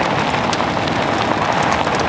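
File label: surface gravel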